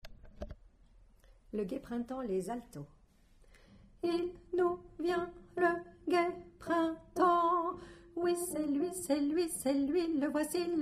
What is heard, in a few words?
fanfare Alto